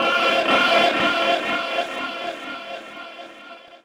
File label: vocalizer, Drum N Bass; Sonic Foundry (Sony Creative Software); Knutrix Drum & Bass